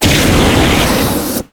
jump burst; cameraman rpg; crookback

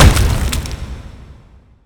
punch damage; blizzard spawn final form

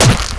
attack; zoochosis whale

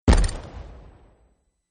step; zoochosis; lazynap; dolphin deepsea monster

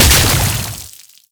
antlion shoot; zoochosis; parasite titan

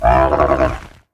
pain, procoptodon; zoochosis pack

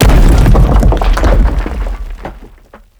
rock impact big

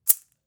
foot left; maraca raptors

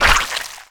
dismember blood; zoochosis; deep sea; lazynap

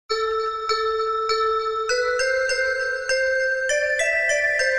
phase 1; Garry's Mod; sprunki jelly fish

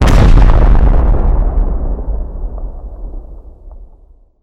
rocket explosion; blind mace; UNDEAD

